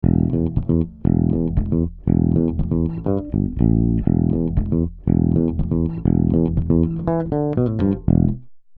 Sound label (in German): Tubesessor Bass Kompression 80 Hz SC Filter Mild Saturation; Heritage Audio